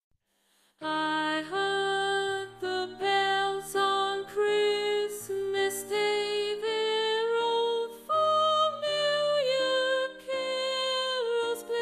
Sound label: Soprano emph, choir practice; summerwind ward choir practice